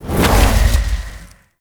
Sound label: Void Dragon Orb Return; Enemies; Abilities; Warframe; Duviri